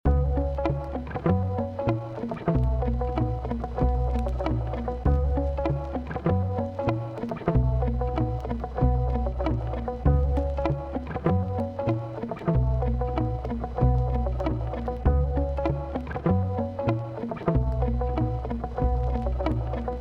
we go around full loop

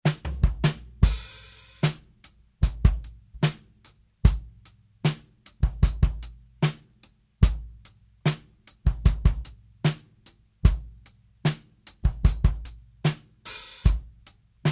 pop, full mix

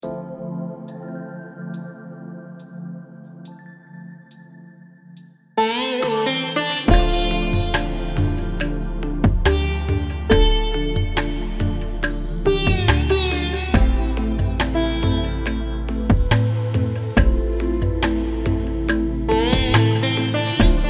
indian music

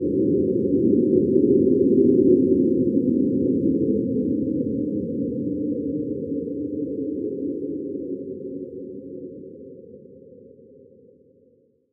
eternal wind

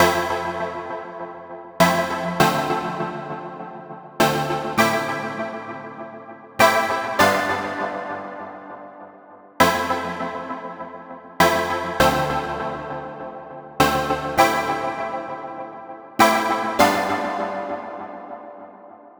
fmaj7 100bpm dance

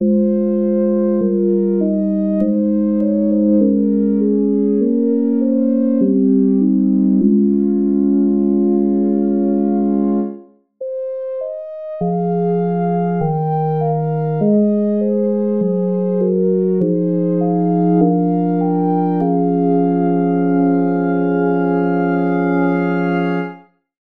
pad; eden connection

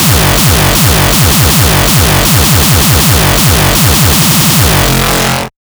industrial bassdrum 160 bpm

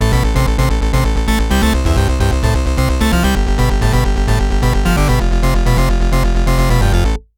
electro grind

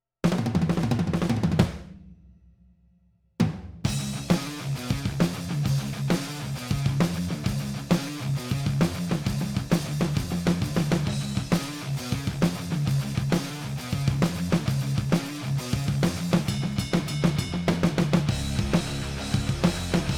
metal mix

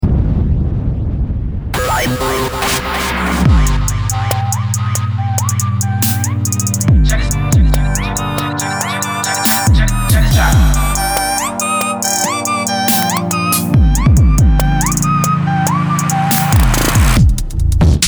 vintage machine drums

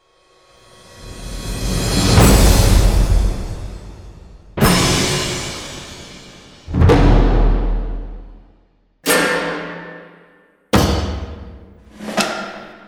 percussion hits

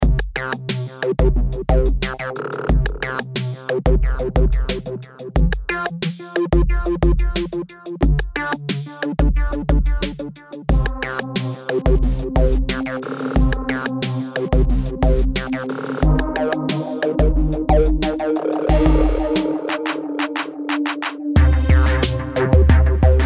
dark tempo; g8tor

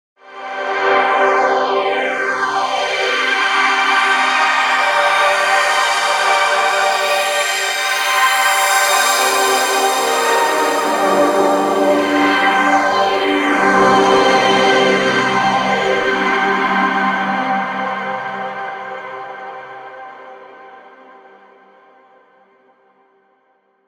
space pad

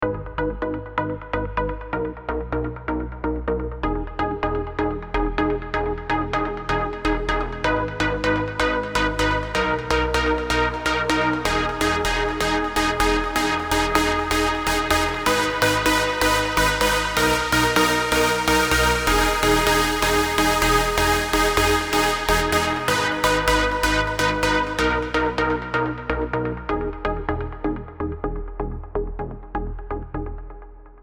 prog pluck

